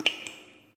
waterdrop; theGoonies; Retro

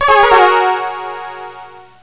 Droid Death; Bombzuka; Retro

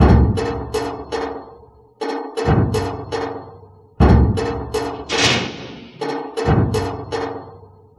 Acoustic Percussion

